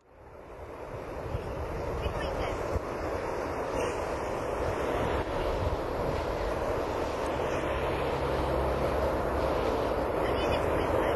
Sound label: Concorde; هواپیما کنکورد